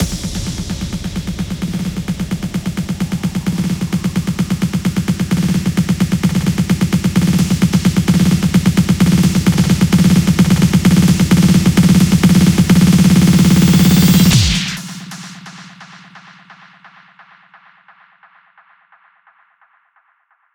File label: Intro 130BPM Fill